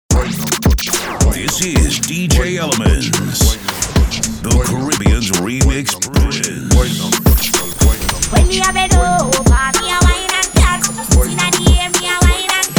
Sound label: dancehall mix; trending dancehall mix dancehall hits